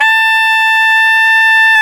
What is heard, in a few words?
SAX TENOR; Tenor V-sw; 4way; Roland L-CD702